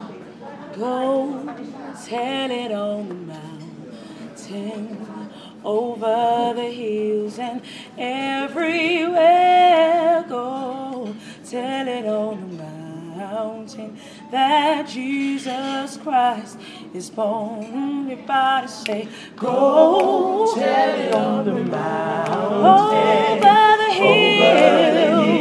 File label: go tell it on the mountain sung by the gospel choir; london community gospel choir